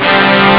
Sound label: jump; Amiga Soundtracker Sample Packs